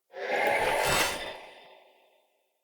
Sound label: Corruption Wave Cast; Enemy; Lady Deora; Abilities